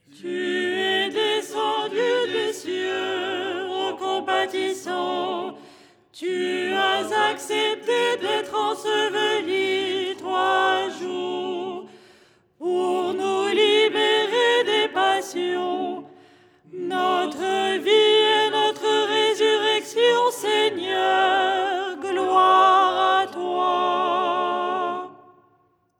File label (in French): soprano